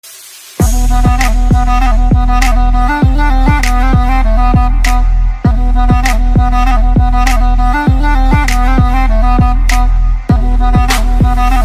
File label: Ring Tone